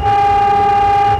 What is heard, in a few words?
Klaxon, Train; OpenBVE